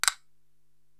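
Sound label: Castanet Double; Korg TR-Rack; Drum Machines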